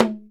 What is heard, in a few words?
logdrum, percussion; ILIO; Synclavier Percussion 2; World & Orchestral